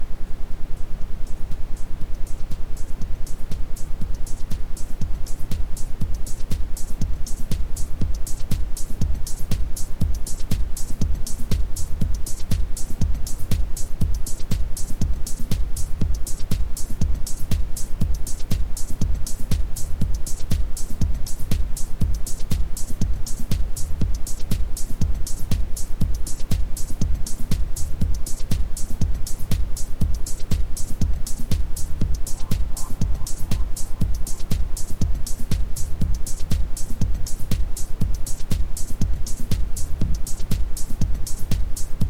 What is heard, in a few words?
isochronic, Ambient Sound; Gute Laune Lazy Quickie